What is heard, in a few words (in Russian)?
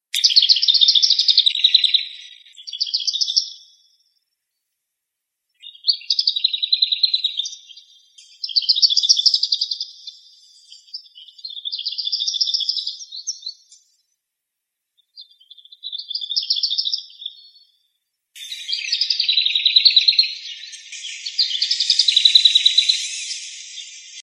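slavka zavirushka, lesser whitethroat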